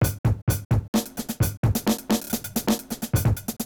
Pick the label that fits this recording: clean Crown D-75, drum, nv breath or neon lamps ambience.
drum